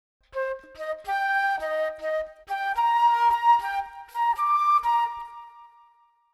Floeten Djingle Startsound

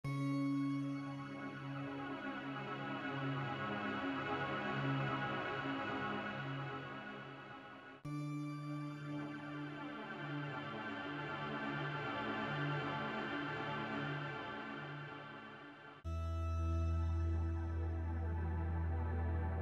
Keybo kurz; sphérique keyboard 24 secondes

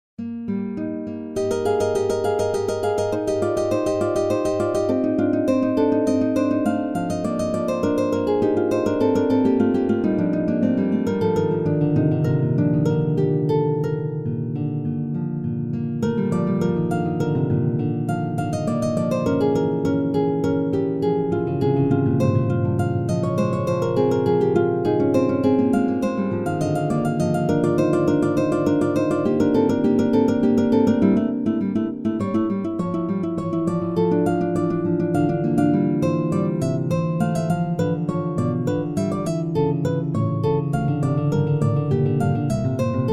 Gitarren Duett

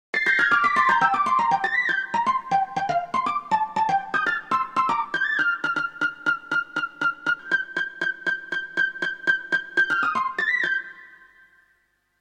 Guitaren pizicato Solo; guitaren-solo-pizicato 12 secondes

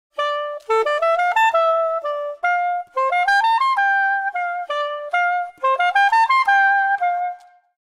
Saxophon Djingle kurz

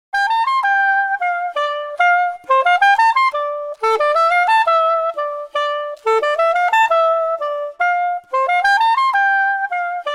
Saxophon Djingle

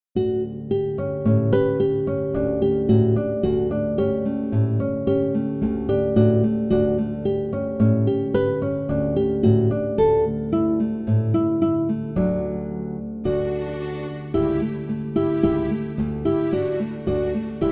Laendler; Alemannen

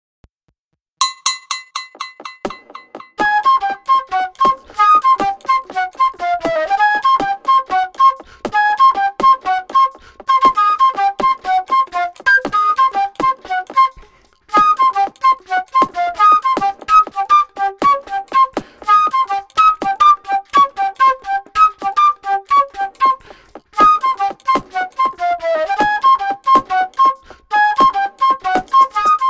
die flotte Floete

